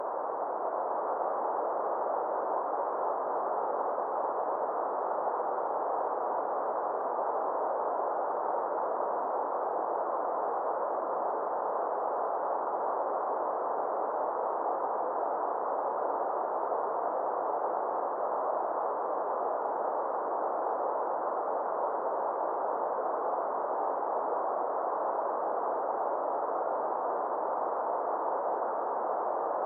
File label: whitenoise